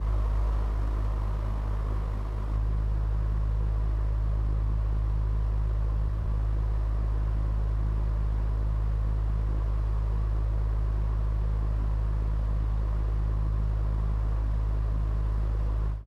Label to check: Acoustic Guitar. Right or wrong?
Wrong